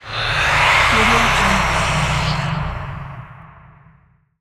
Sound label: evil breath